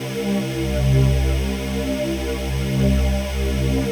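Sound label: SEA pad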